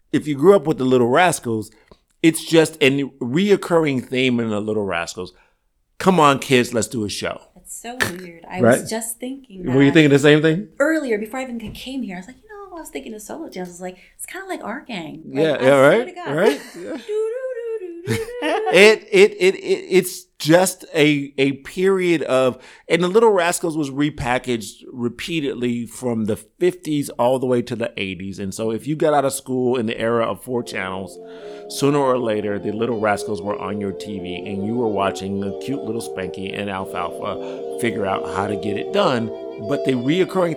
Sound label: Solo Jams; Nightcap Ep 5